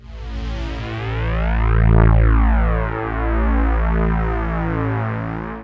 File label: FLT SWP, synth; AMG; Global Trance Mission VOL-2